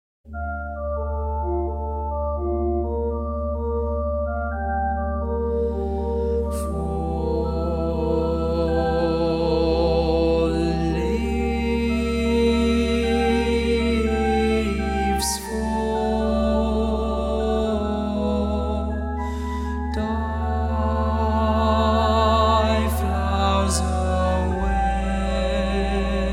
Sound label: Tenor